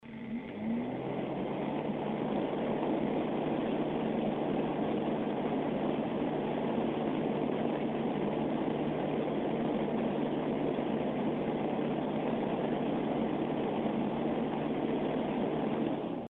apack cf900 hi

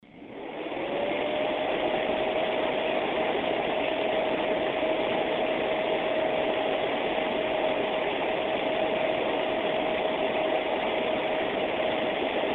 glacialtech 5610pw h

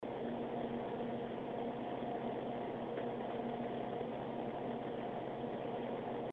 asus triton 77 hi

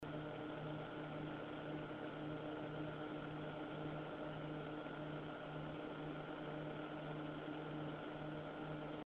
glacialtech 5750 pwm lo

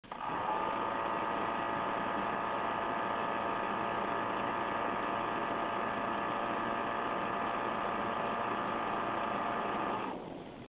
cm aquagate mini lo

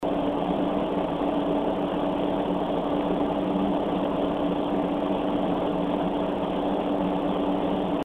cm gemini is hi

